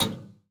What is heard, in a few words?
Intro Server Room Light On Blink